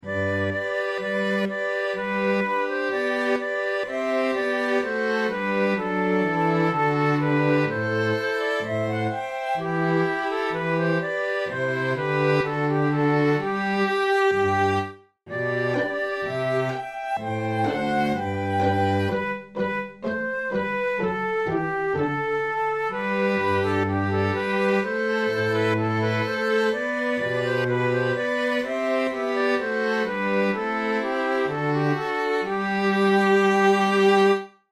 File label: strings, flute; all in a garden green